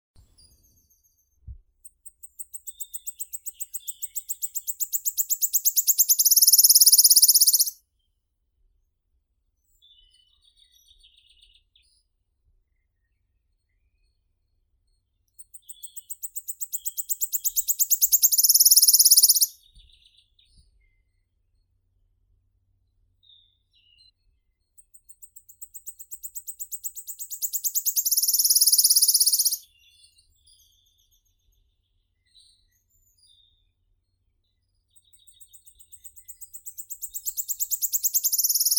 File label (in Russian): penochka treshotka phylloscopus sibilatrix